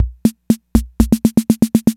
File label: INTROS FILL, DRUMS PERC YAMAHA